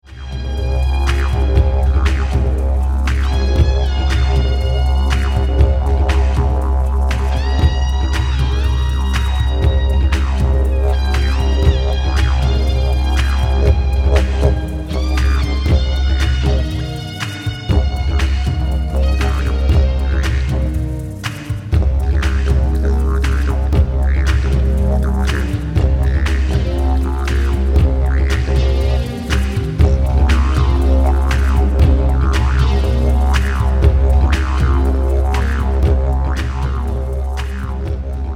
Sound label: Ensemble